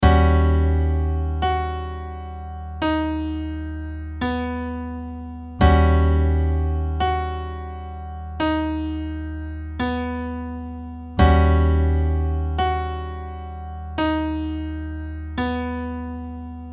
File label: B Maj triad over Eb7 sharp nine